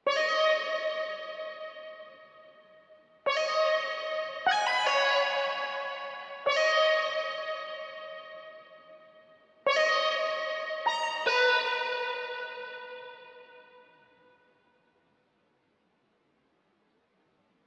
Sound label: 150 BPM G# Min Lead, melody loop stem; Cymatics; 2022 Melody Collection; Wavy; Nebula